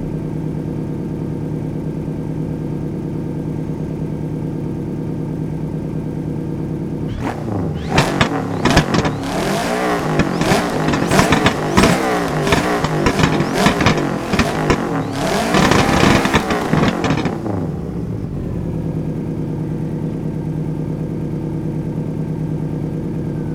idle, vehicles cars; subaru BRZ; lwcars

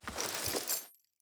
mag draw, weapons; cw m249

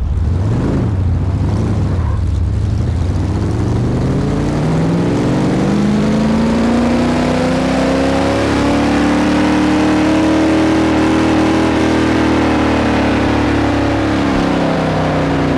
reverse, vehicles cars; chev cucv; lwcars

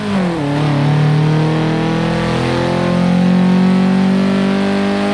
third, vehicles cars; lwcars

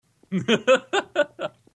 laugh; assassinm4a1

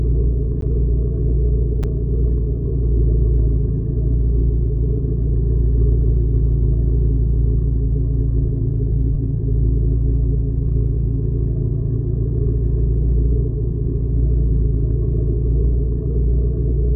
idle, vehicles cars; lwcars

